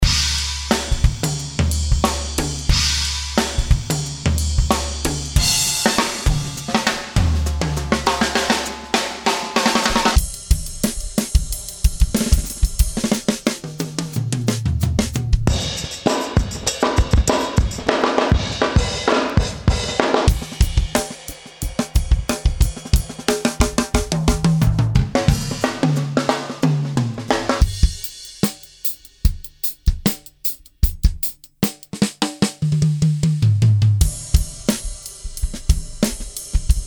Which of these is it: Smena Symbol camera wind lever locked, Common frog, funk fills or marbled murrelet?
funk fills